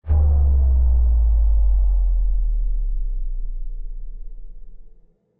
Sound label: slomo